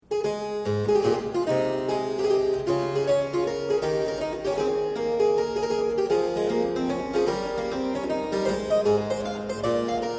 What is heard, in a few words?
Suite in A Major - Corant, Keyboard Music